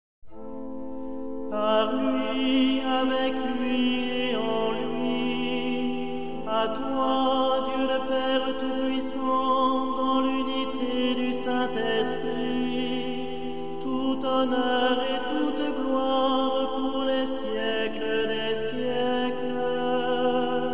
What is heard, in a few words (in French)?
Par lui avec lui Amen Gloire et Louange; mariage